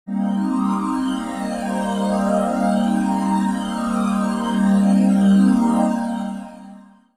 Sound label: Digi Pads; Jungle Frenzy 1